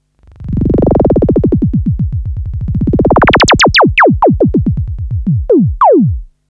Eigenschwingung Filter Mod

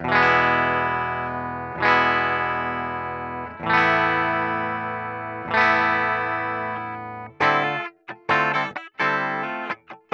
Ibanez RG631 Clean Steg Humbucker

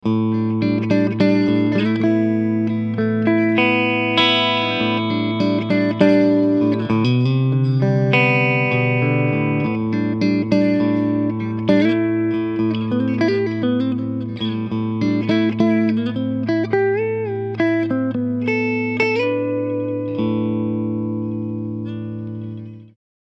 Beide PUs SC Modus Clean

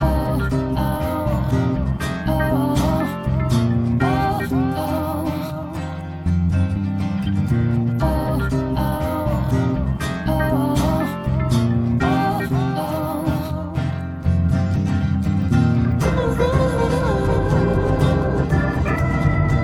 ohne Drums; Steinberg SpectraLayers Pro 10 Demo

